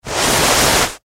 Ski Skid Stop, Ski Skid Stop Brake; Skiing and Snowboarding; Sports

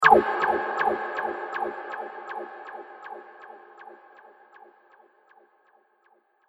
Laser Echoes; Sci-Fi Laser Sound Effect

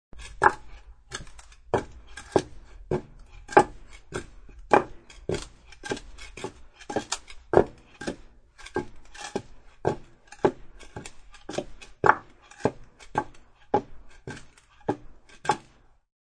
Clogs walking on concrete floor.; Footsteps

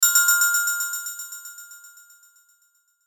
chime bells delay; Multimedia; Chimes; Blastwave

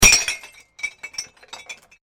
Bottle Plink, Glass; Materials; Sound Effects:Materials; CraftedSignals

